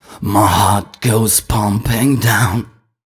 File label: m 128 mel b-flat, Vocals